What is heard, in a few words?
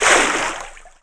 water spurt splash; Tony Hawk's Project 8; XBOX